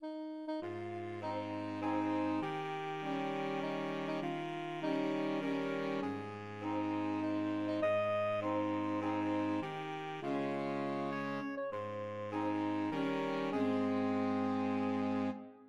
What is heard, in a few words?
happy birthday SAX, saxophone quartet